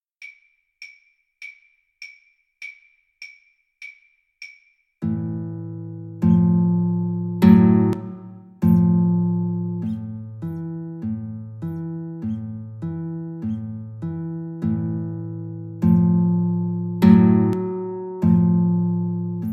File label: Guitar Duet